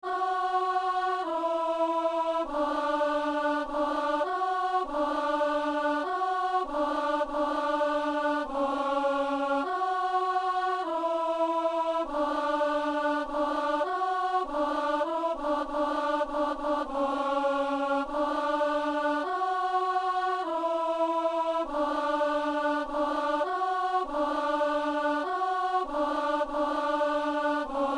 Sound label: alleluia alto; Messe du 17 janvier à Saint-Denis; Alleluia de Taizé